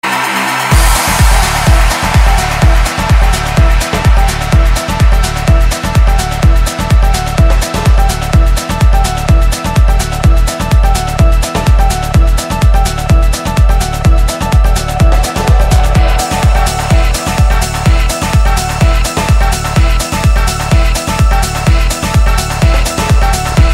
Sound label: samsung galaxy s9 ringtone